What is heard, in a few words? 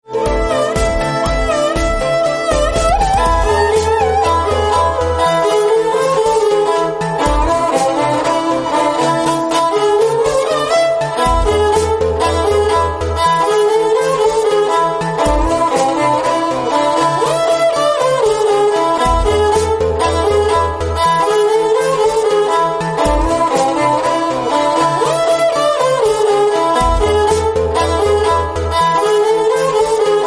sarangi tune